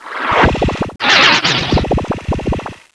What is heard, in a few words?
Laser Lab Sound